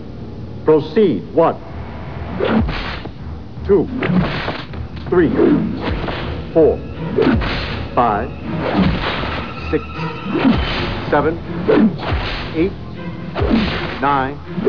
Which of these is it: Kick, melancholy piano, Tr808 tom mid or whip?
whip